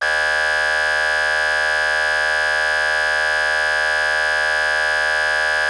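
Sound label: Federal Signal Omega 90 Yelp, siren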